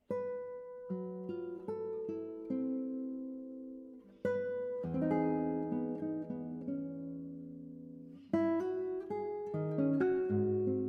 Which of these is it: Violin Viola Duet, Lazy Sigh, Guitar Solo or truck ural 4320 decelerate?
Guitar Solo